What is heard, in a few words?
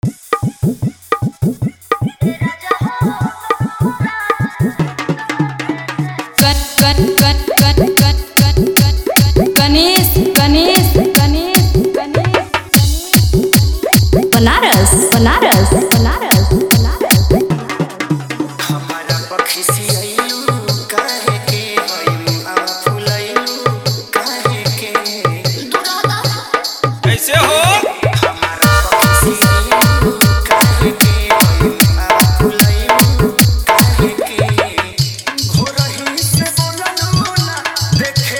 New Bhojpuri Dj Remix Song